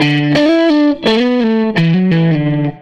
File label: Strat Guitar Loop; Sonic Foundry (Sony Creative Software); Six-String Orchestra